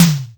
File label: Toms